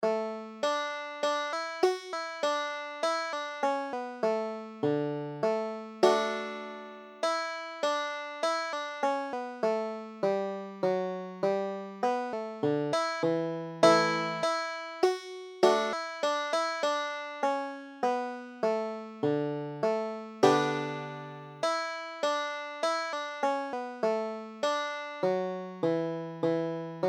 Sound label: banjo